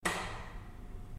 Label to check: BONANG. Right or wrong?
Wrong